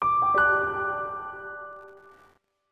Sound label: Reward Court